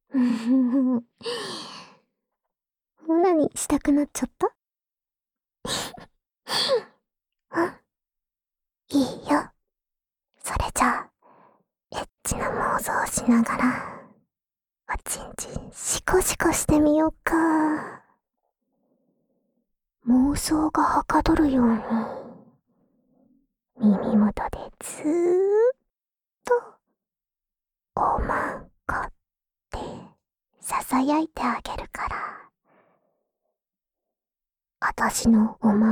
オナサポ用おま○こボイスループ 右耳; オナサポ用おま○こボイスループ（右耳）

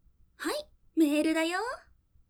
メール着信・受信, システムメッセージ

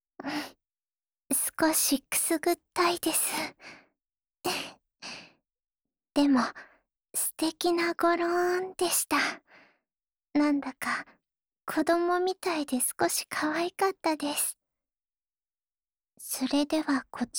お風呂上りお耳かき(左), ASMR

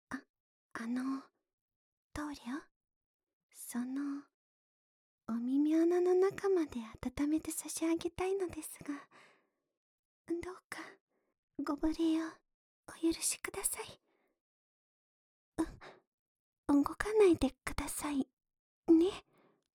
左 唾垂らし 浅めゆっくり綿棒, ASMR

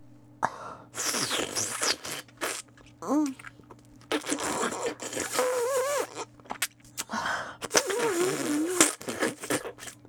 吸うフェラ音, ASMR

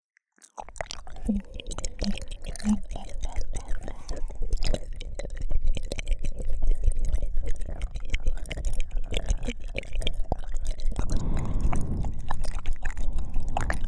耳舐め

